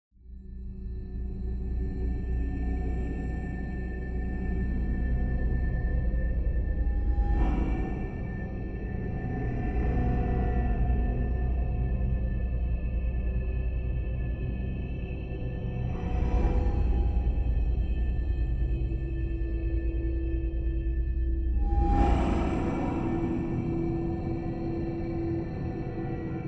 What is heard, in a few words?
Cave Atmos